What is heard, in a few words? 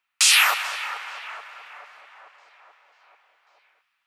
One shot NOISE; parandroids sample foundry vol 1 sample pack